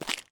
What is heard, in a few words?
fantasy Footstep Dirt; little robot sound factory